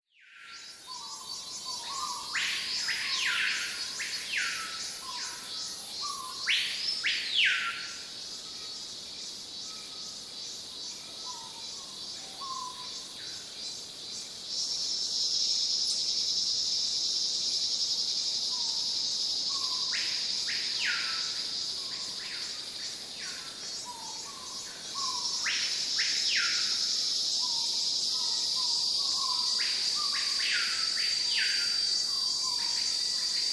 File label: Cicadas and exotic birds in Forest